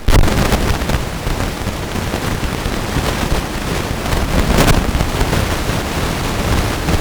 extreme hiss; sennheiser me64; guatemala; sustainable goals

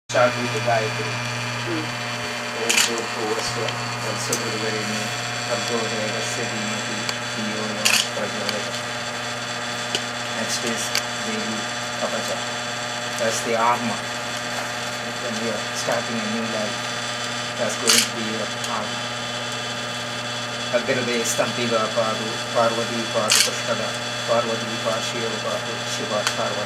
all bad audio

